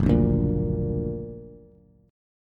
Gb Augmented Bass; Gb+ strummed

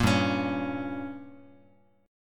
G Diminished, Guitar; strummed; JGuitar

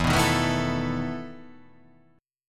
E Major 7th Suspended 4th

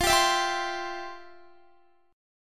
F Major 7th Suspended 2nd Guitar; strummed; 4F,4A,5C,5G,5C,6E; FM7sus2; JGuitar

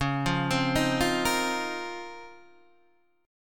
C sharp Major 13th C sharp, Guitar Open D; down; chord shape

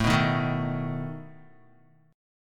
A Suspended 2nd Flat 5th A down Guitar; Asus2b5 strummed

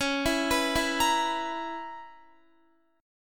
Db Minor 13th Db Guitar; Dbm13 arpeggiated; JGuitar

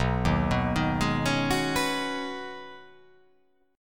B 6th Add 9th B, chord

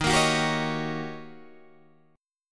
D sharp 7th Sharp 9th, Guitar, Open D

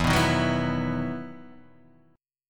E Major 7th Suspended 2nd; EM7sus2 strummed

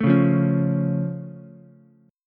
A Augmented A, Ukulele; strummed; JGuitar

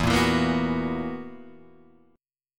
B Major 7th Suspended 4th E

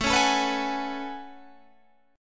A Major 13th, down Guitar Standard; AM13; strummed; capo=2

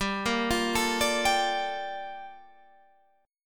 G Minor 9th

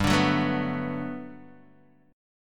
G Major 9th, G, down, Guitar, DADGAD

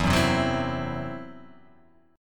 E Suspended 2nd Sharp 5th E, down

